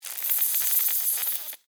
Acid Burn Sizzle, Scifi, Acid, Burn, Sizzle, Fizz, Small, Science, Fiction; SoundEffects-BlastWave